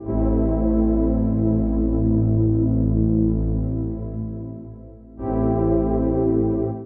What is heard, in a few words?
TECHNO PAD 140; Keys